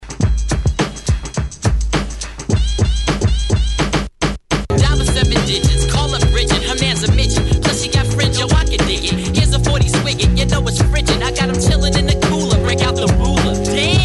90s Intro 105Bpm Clean; DJDOLLS

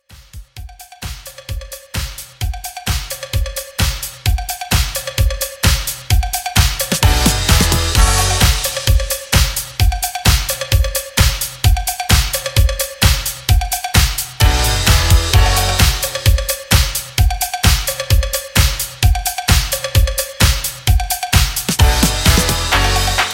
80s Dance Re-Drum 130

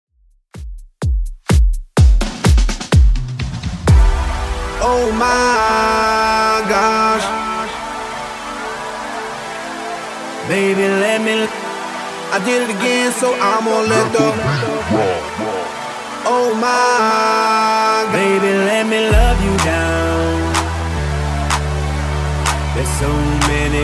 2ks Redrum 126 bpm

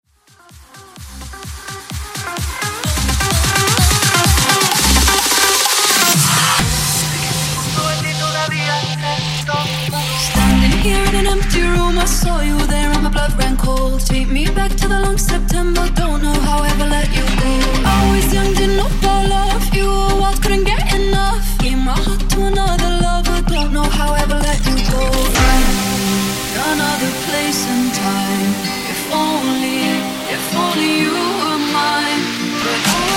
Top40 Bigroom

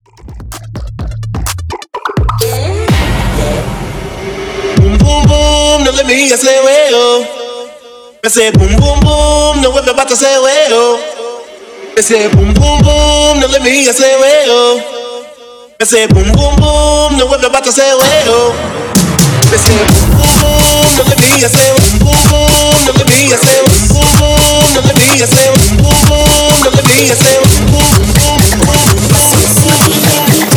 Hype In Outro 127 BPM

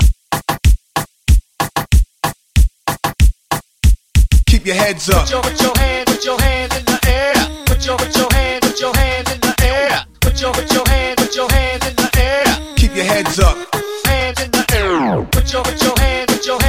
Top40 Reggaeton Hype Into Re Drum 94 Bpm Clean

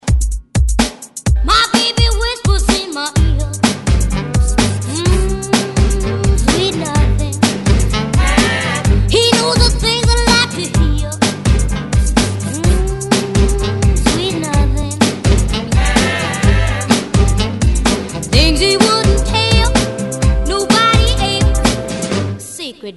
ReDrum 127 Bpm; DJDOLLS

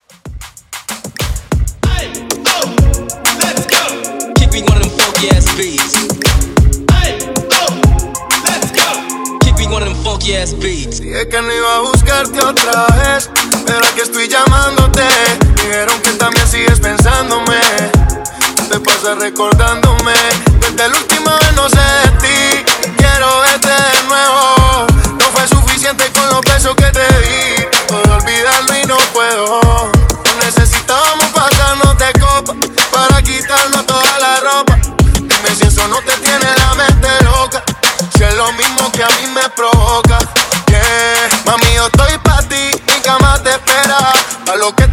Latin Hip Hop Beat Hype 96 BPM

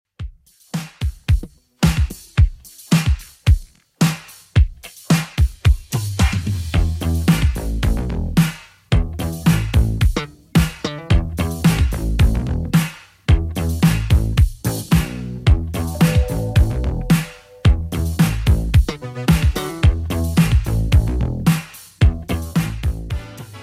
80s Redrum 110 bpm

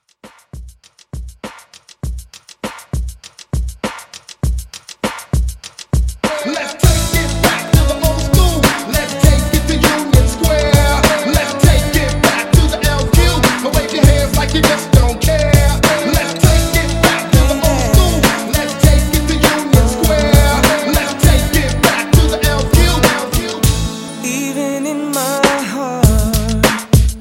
Old School Hype Re Drum 100

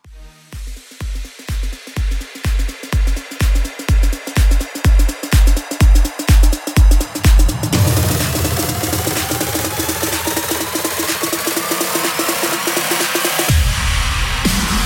Big Horn 125 CLEAN

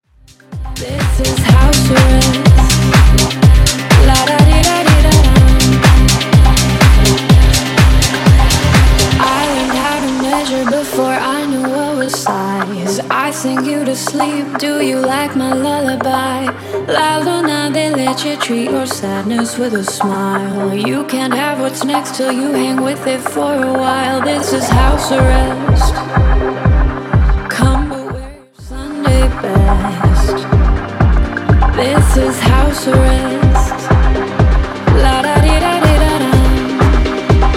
Vocal House 124bpm

